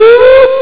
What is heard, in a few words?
woop